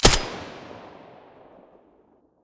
m4a1 silencer stereo, weapons

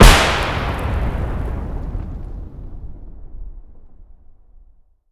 explosion far, grenade

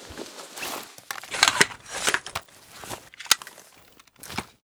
rpg7 reload, weapons

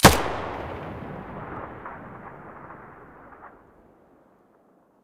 muzzle; weapons; pp1901; vityaz

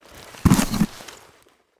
pistol mask use; STALKER; IWP; item usage

